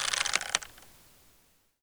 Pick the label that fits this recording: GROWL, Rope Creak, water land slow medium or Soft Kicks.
Rope Creak